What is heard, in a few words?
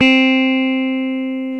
GTR, Dual Guitar; Club-50 - Foundations Roland